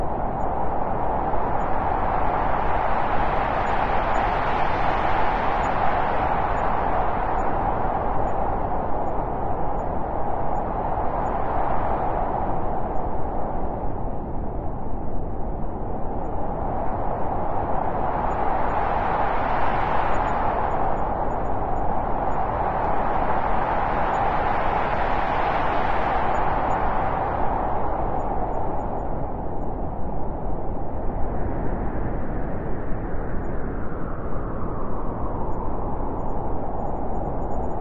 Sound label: blizzard; simple-weather